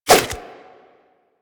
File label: Nunchuck End Whoosh C, Infested, Weapons; Warframe